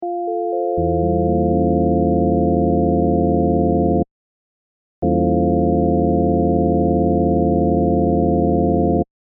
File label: FM over E dim7